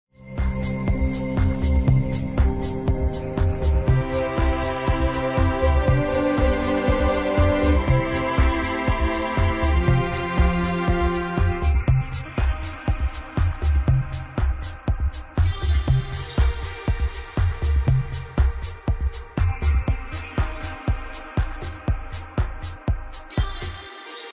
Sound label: vocal dance